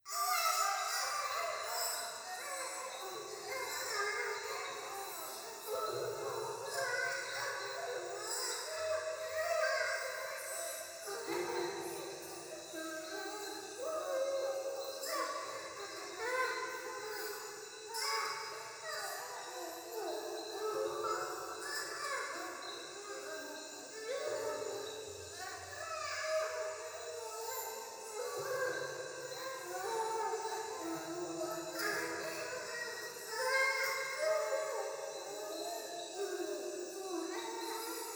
風呂 シャブ喘ぎ 山奥夜, ASMR

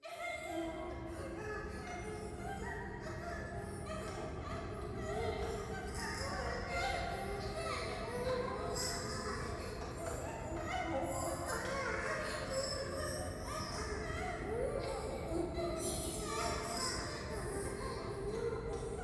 風呂 喘ぎ 電車

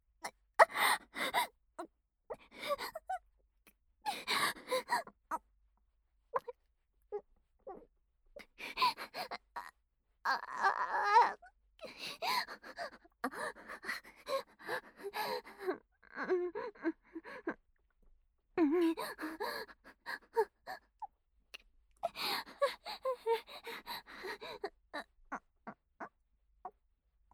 少し激しめ喘ぎ, ASMR

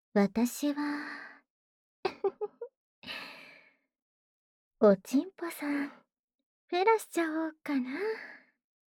妖艶 ねっとりフェラ; フェラ素材

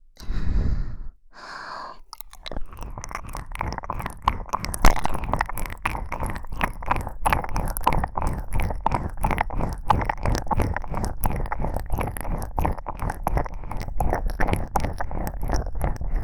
耳奥ぞりぞりコース

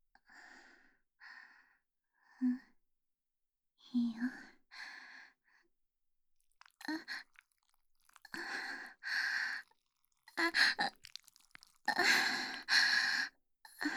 挿入 喘ぎ; SEあり

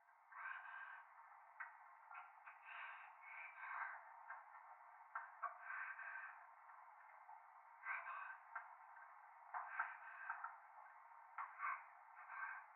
トイレ 左耳 密着 処女 喘ぎ 電車

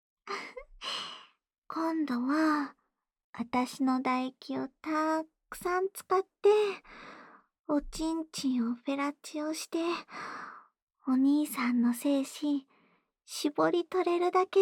唾液多めのフェラチオ

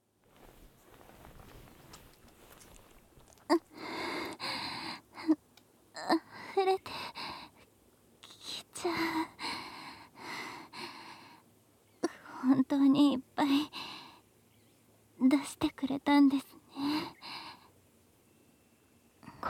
両乳首舐めマッサージで寝かしつけ

